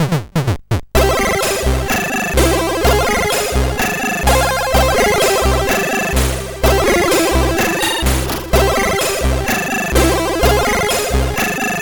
Music Samples, sid, CSG8580R5

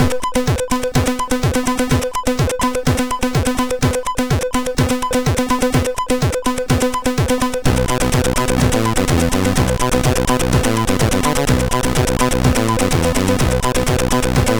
Very Old, MOS6581R3

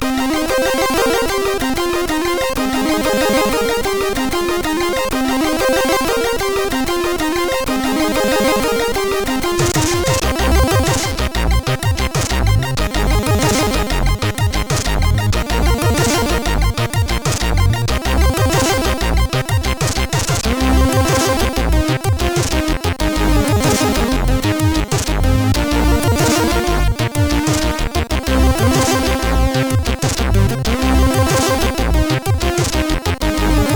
A Melody, CSG8580R5; A Melody for DT; hvsc

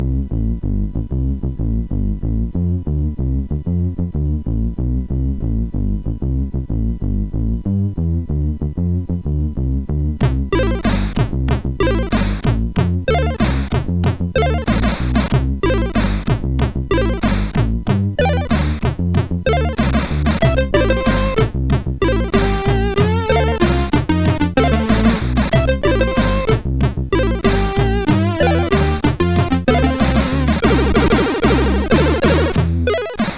Batfunk, MOS6581

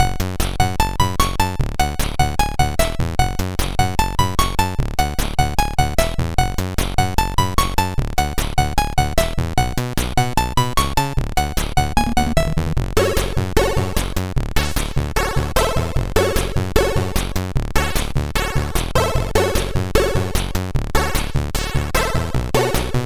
Mockup, MOS6581R4; Lost Vikings

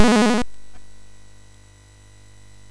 Dragons Den, MOS6581R3

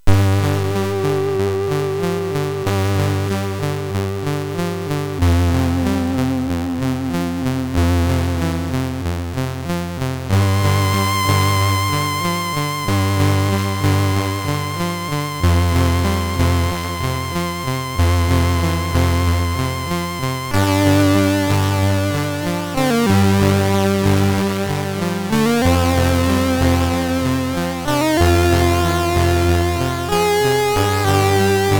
Loopz, MOS6581R3

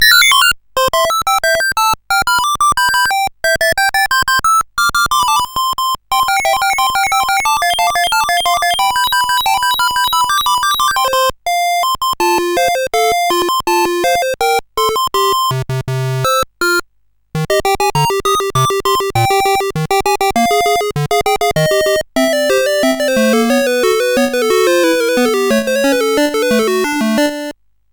Musicbox, sid CSG8580R5

